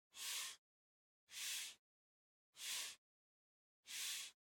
PC Mouse Move Far Soft Pad Mid

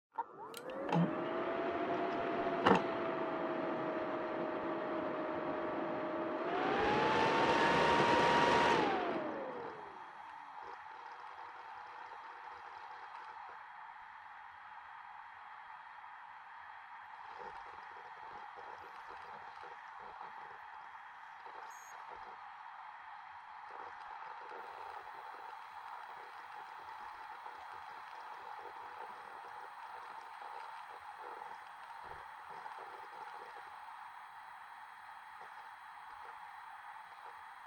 PC Operating Noise On Off

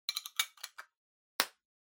Pocket Watch Snap Off Case Close

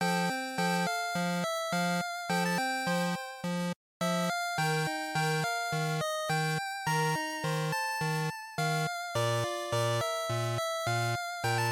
GB RPG Title Loop105

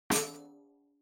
Tambourine Dry

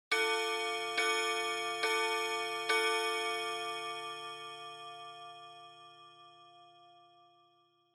Pendulum Clock Chime Long; Denoise

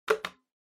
Tabletop Timer Digital Button; 卓上タイマー デジタル01-02(ボタン); タイマー機器

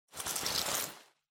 Pocket Watch Pocket Suit Take Out; 懐中時計; スーツ

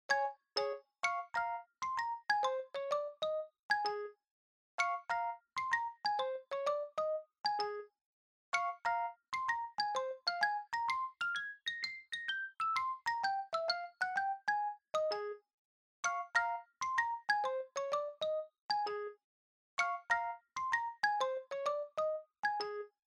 Shuffle; Frog Legs Rag

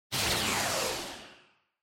Motion Speed Lines Short

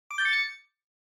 Short Accent Low; ショートアクセント; 低

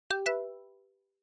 OS Sound Notification

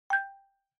Xylophone Multi

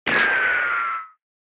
Retro Anime Gun

